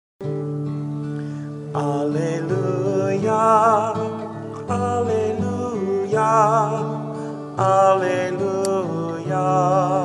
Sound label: Gospel Acclamation